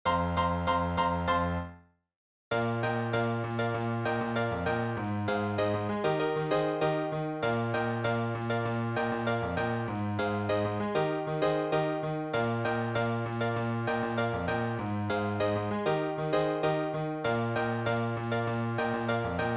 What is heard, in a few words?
accompaniment; flashdance vocal audition materials; KEYANO THEATRE COMPANY AUDITIONS